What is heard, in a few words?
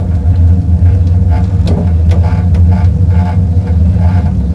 ski lift; WhiteOut; XBOX; crested